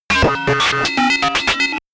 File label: calculator, comedy cartoon robot; soundpool